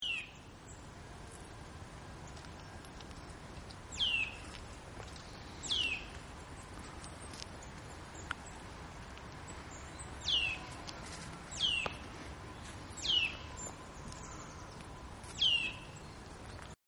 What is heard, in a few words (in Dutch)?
Boomklever Sitta europaea Geluid; Annelies van Klinken